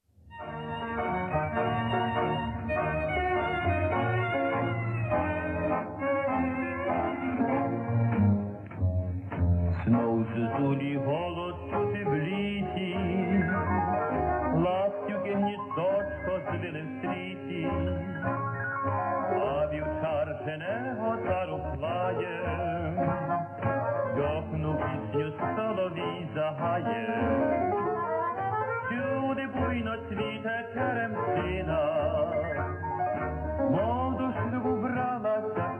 Old Ukrainian tango

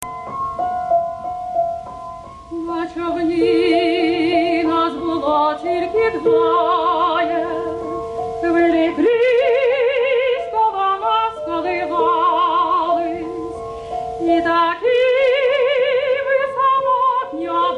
Ukrainian art song; on poetry Lesya Ukrainka

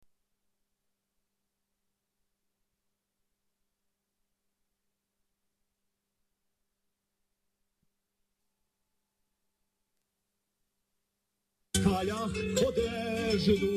Ukrainian baroque song

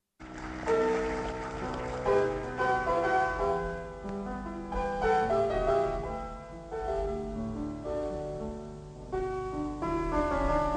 Ukrainian song; serenade

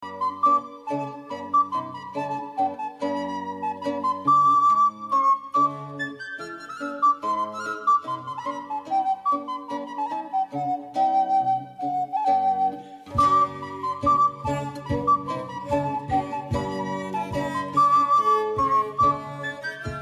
Ukrainian baroque dance

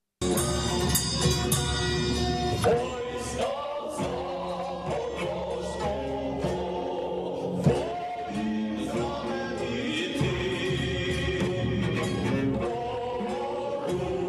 Ukrainian baroque song

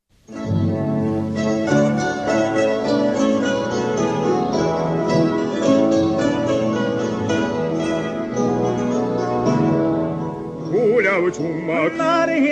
Ukrainian tenor Ukrainian traditional song; Ivan Kozlovsky

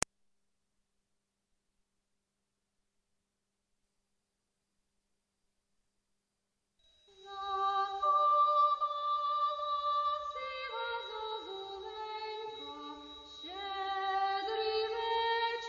Ukrainian Christmas

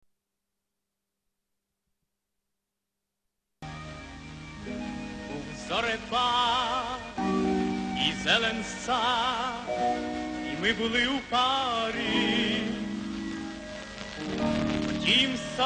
Ukrainian song; serenade

